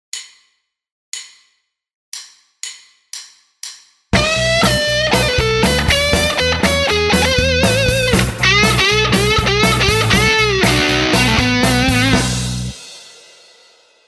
guitar style

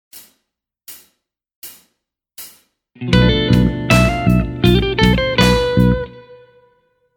Modes Locrian lick

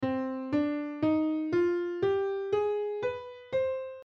c minor harmonic scale

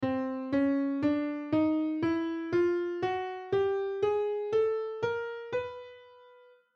chromatic scale up

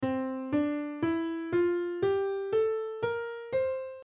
mixolydian mode piano scale

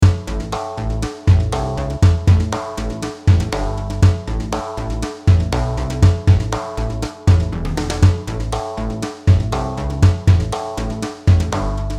Brushed ride groove

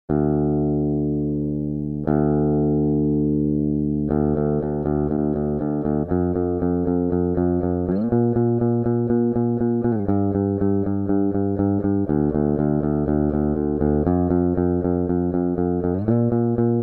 guitar sound clean

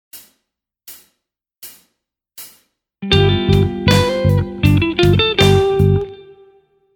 Modes Mixolydian lick

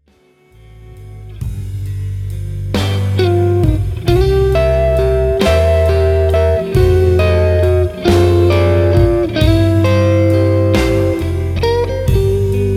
blues turnaround solos

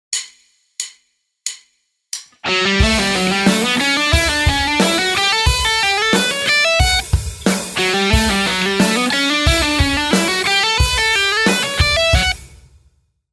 mega lick slow

